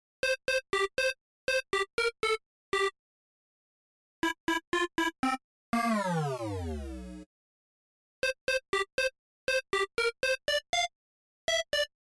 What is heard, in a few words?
melody